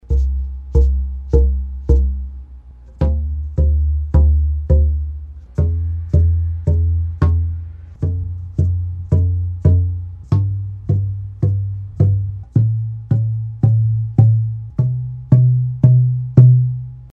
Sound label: oct bass dia, boomwhacker